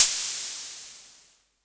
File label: Perc Hit, Noise; noise hiss crackle; Noise Perc Hits